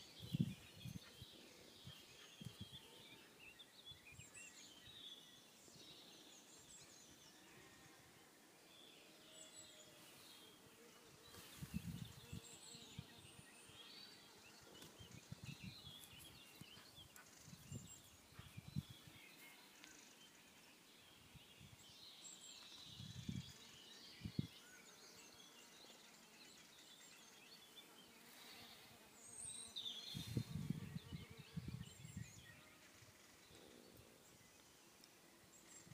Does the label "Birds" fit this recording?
Yes